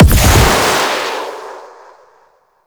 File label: Smite Slam; Warframe; Paladin